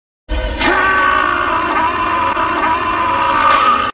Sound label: pain scream